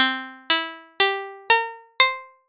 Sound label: scale; 4ado